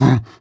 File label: breath short, zombie; creatures